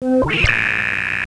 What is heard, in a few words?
Glitch